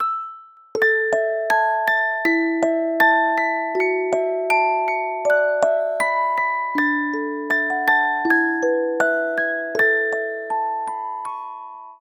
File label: Glimmering, ringtones; Samsung; Galaxy Watch 8